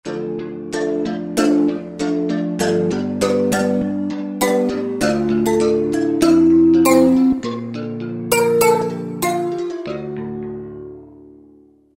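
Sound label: Kalimba, Roland V-Synth; Factory Patches; 234 Kalimberd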